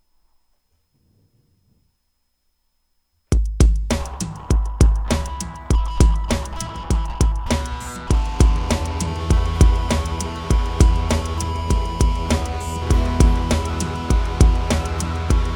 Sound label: Guitar and Vocal